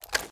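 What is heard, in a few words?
assault rifle raise start; weapons; gmod; viper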